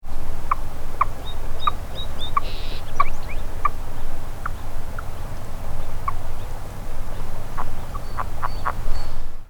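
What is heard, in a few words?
raurora short, frogs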